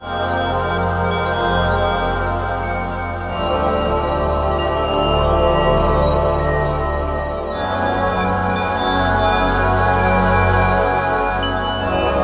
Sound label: AAH BELL; eShop